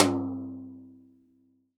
TD-20 Tom B, Roland TD-20 Toms

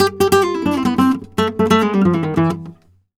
e, Flamenco Guitar Picado; Picado 1

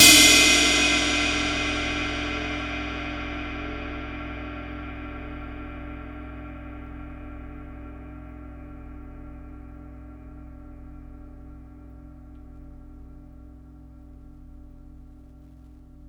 Cymbal Hit, Impact Drum Hits

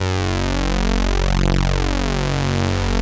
POLY SYNTH; Wizoo - Powered Wave

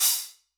White Chiff, Hats; Airwindows

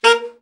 BRA ALT, Alto Sax

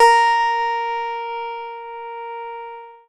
PLUCKED, Synclav FM, A#; Big Fish Audio

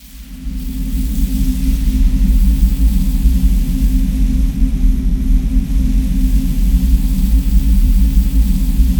TUNNEL RAIN; Spectrasonic Distorted Reality 1

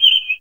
BIRD FX

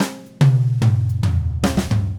Red Maple Groove 110, DW Red Maple Kit

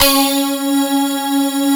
SY big synth

